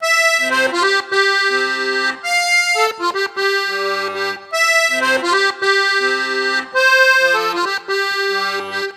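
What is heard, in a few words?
Accordion 107bpm C, African; Quazibeat - African Secret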